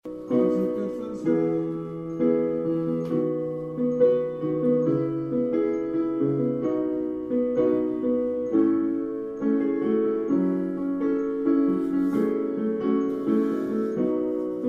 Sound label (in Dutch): sopraan